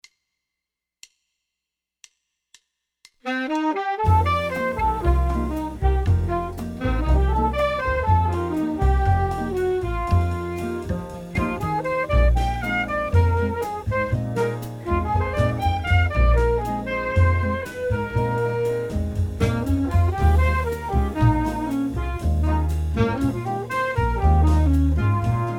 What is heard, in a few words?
mixolydian, tenor saxophone